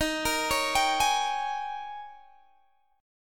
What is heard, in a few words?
D sharp 11th D sharp, Guitar, Open G